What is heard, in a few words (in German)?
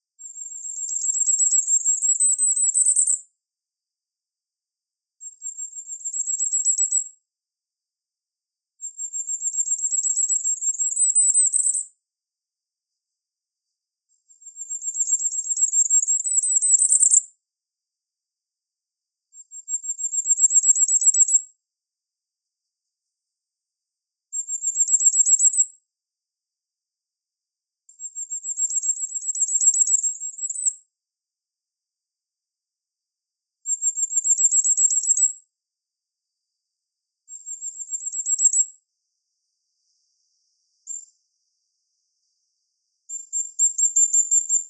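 Sommergoldhähnchen Gesang, Vögel in Europa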